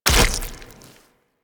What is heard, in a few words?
Land Heavy Flesh, Footsteps; Warframe; Tenno